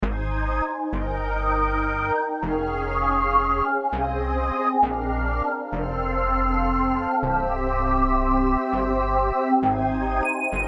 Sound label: instrumental